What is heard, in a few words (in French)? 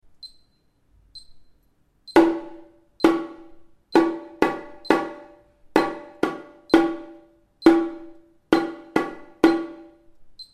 percusion exercice